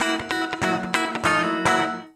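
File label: guitar, Drum & Bass, 145 BPM; Ueberschall; Drum & Bass Resonance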